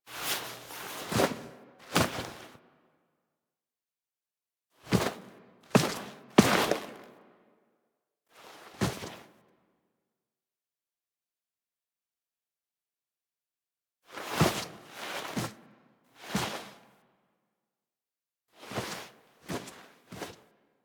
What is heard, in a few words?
Walk Foley; Warframe; Sacrifice; Sacrifice Arsenal Wake Up